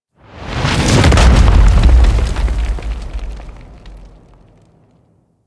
Orb Intro Landing; Warframe; Ambience; Venus Open World; Objective Rooms; Venus Obj Deck Twelve

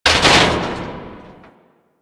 Military Checkpoint Metal Hit; Warframe; Ambience; Nineteen Ninety Nine

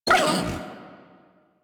Feral Vocal Pain; Enemies; Kubrow; Warframe

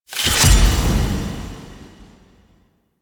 Stance Ability Deactivate; Warframe; Teshin; Powers

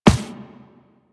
Walk Default, Footsteps; Warframe; Operator

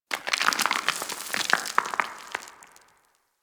Outpost Rock Debris; Warframe; Ambience; Corpus; Outpost Defense Two

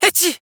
Sneeze; Fable Of Garren Rood; Avakot

